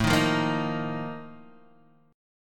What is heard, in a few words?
Bb Major 7th Suspended 4th